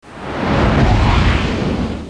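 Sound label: ambience; INC demo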